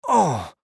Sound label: Human Moan; Moan Short Male Fifty Yrs; Pain; Voice; Blastwave; Humans